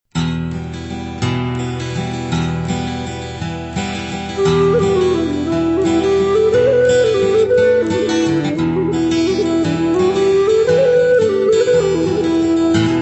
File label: jig medley, Celtic band; Swallowtail Jig; En Ghba Ban